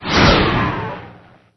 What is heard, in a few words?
sommersault; sonic-radiance